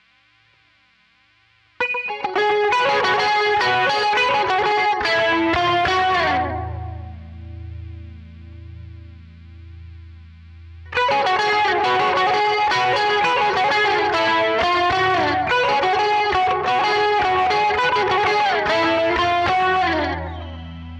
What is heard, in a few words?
quilter solo